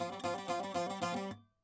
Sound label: saz